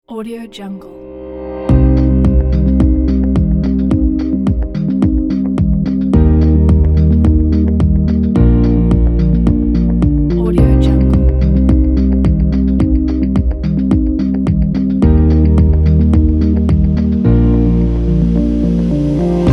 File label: ambient technology